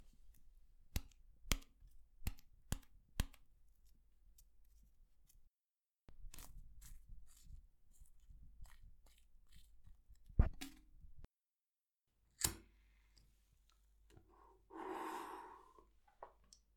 Cigarette Ashtray Snapping Lighting

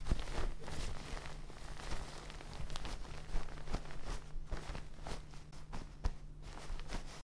Feather Duvet Ruffling

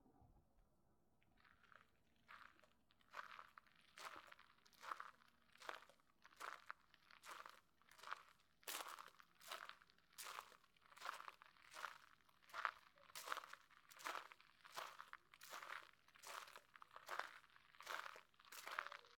Footsteps On Crunchy Gravel

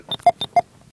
Hilo Pips

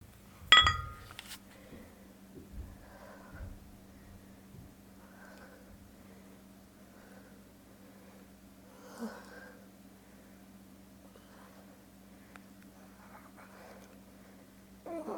Workout

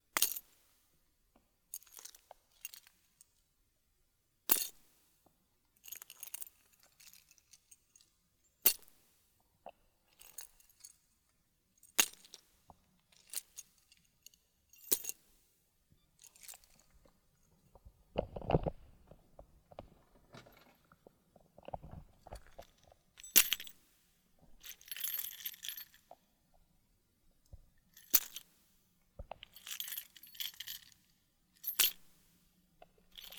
Keys Dropped Dropping And Picking Up

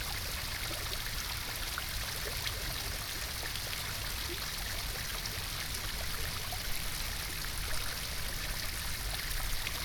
Paronalla Park Stream

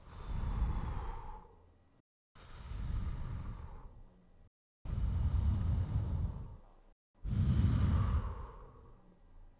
Blow

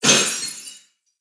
Distant Indoor Glass Breaking

